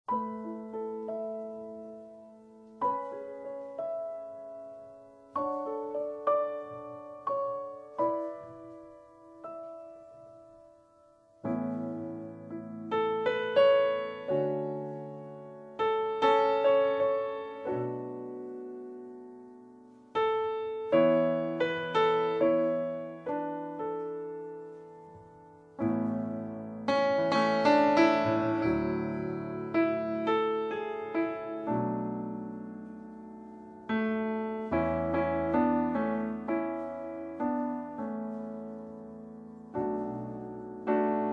Reprise INSTRUMENTAL Stage Song; High School Musical